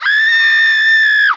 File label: female scream; cs2d; cityrp; pedestrian